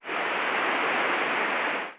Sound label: pour